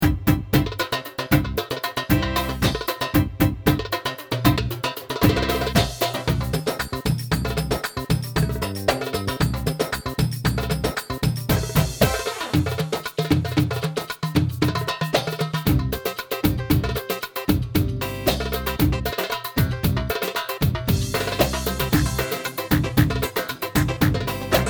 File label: 6-8 Soudasi